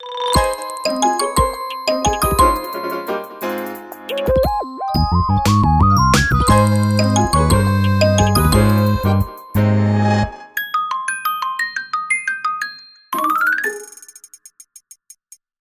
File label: Rhythm; Vivo X5 Pro; ringtones